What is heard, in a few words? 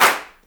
CLAPS DRY, HANDCLAPS; Spectrasonics; Heart of Africa